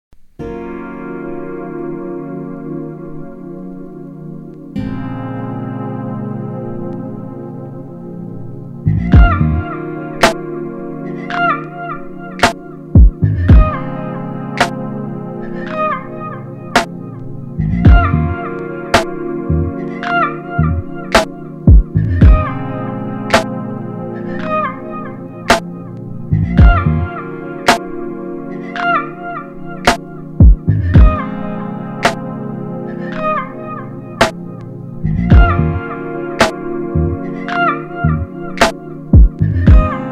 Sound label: Instrumental